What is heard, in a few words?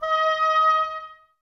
OBOES D#; Miroslav Vitous